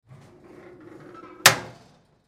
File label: Stove Lid Open, Stove Lid Open Antique; Historical; Appliance; Household; Blastwave